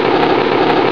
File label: rocket